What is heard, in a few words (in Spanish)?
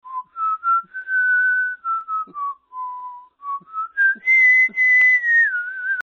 silbido